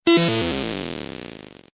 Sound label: Atari Beam